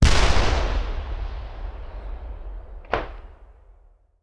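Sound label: Artillery Rocket Launcher; Sound Ideas; Guns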